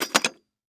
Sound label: Stand C Stand Setting Down On Concrete; AdobeCC